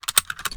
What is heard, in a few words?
kilo98 reload empty load; gmod; viper